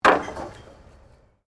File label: Wood Planks Impact Wood Wire; Materials